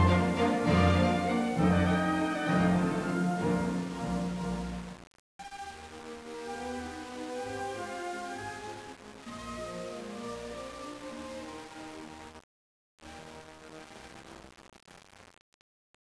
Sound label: original truncated to 16bit without dither; 24-96 Dither Shootout